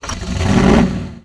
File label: engine start